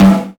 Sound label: low mid tom sample g key; tom drum samples